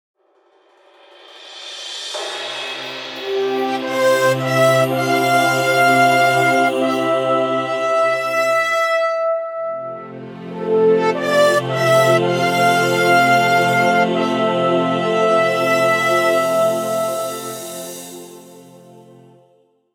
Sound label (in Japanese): ジングル; 神秘の空へ